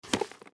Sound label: Combat Boots Pick, Combat Boots Pick Up; Cement; foley; Shoe Sound Effects; Objects; Blastwave